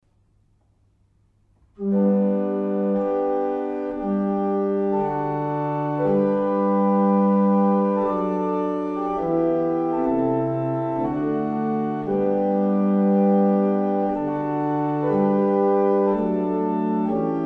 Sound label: barrel organs; Faulkbourne